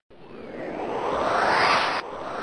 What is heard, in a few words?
Ghost vortex; Computery or Science Fiction